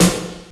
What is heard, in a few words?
snare drum; Crypton - DrumBase5000; 52 SNARES